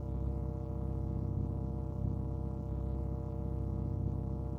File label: Battery Plate On-Loop; viewfinder